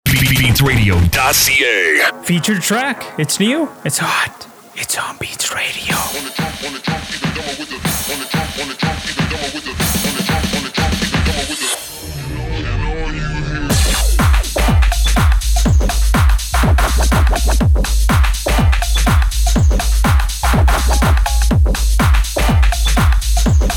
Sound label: On The Drums